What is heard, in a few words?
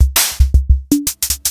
Fricke MFB 502 Kit; Sliced